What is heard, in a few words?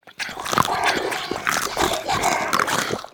Zombie Munching